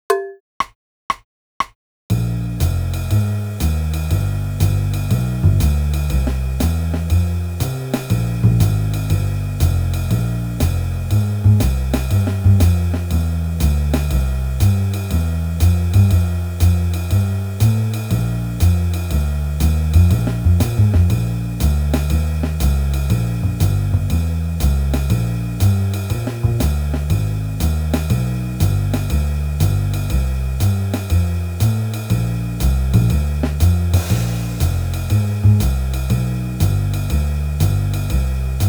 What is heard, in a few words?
no piano